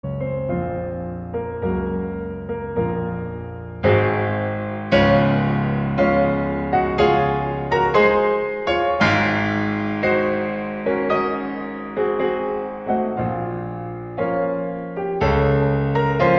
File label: March funebre; mozart